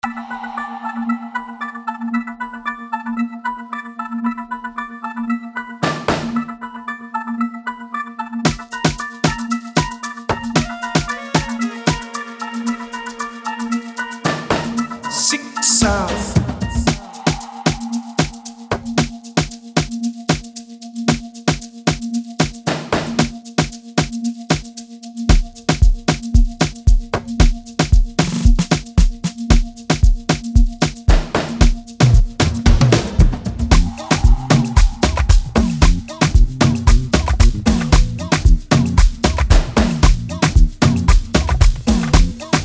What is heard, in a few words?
Freebeat Amapiano; Prod By 2Frosh